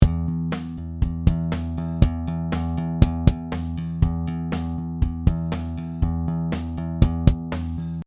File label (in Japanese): キックとベースダイナミクス調整なし